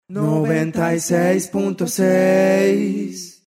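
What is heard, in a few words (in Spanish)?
cantante español neutro singer american spanish, Dial 96 punto seis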